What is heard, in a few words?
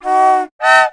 Sound alert; Is Seeing Really Believing